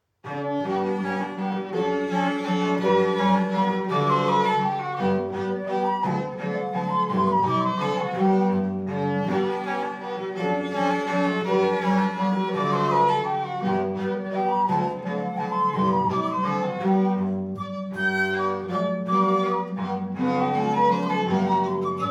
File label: waltz